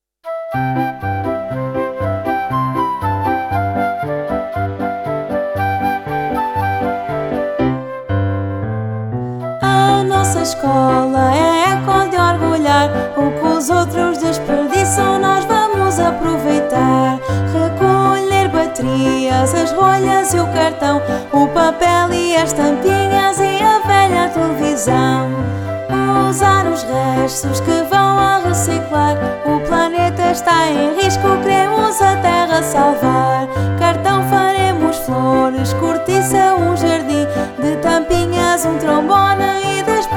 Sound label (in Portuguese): Hino Instrum. + Voz